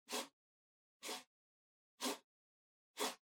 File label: PC Mouse Move Far Wood Short